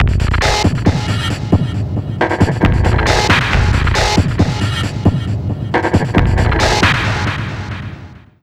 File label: CREEPY; Big Fish Audio; Uncivilised G'rooves